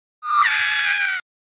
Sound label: uuuurrg